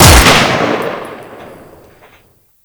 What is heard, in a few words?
Shot, Lever Action Rifle; Warframe; Grineer